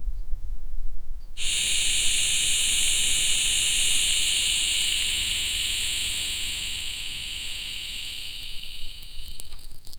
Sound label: an ice witch firing ice, ice